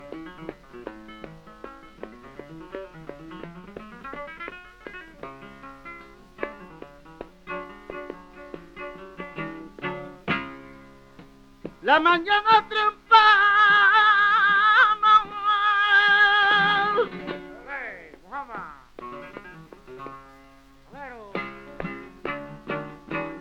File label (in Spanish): Solea, flamenco; del Mellizo